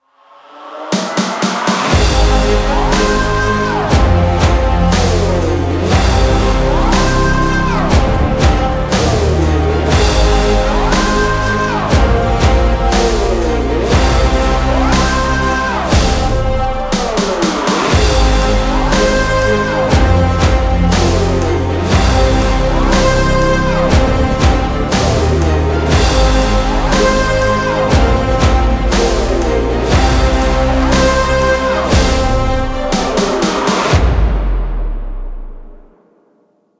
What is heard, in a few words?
Mysterious Clock Trailer Chorus